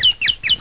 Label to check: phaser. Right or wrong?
Wrong